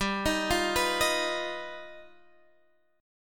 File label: G Augmented 7th G down Guitar Drop D